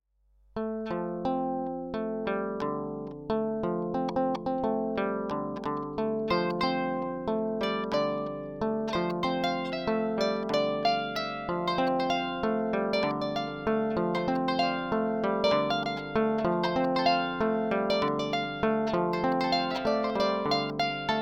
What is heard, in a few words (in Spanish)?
KORA ELECTRICA AMPLIFICADA